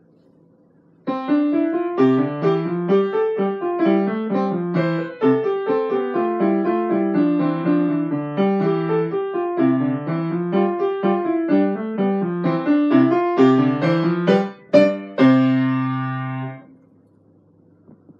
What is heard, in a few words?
Invention in C Major